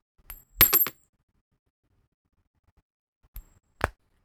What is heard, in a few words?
coin; コイントス失敗